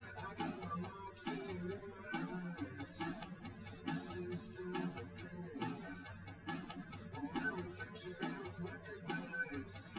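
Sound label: with drums, live rec, 250ms, 1m; SiSEC08; SiSEC underdetermined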